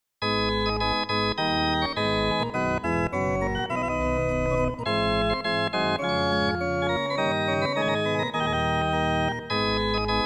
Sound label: La Piémontoise Organ Pipe; Couperin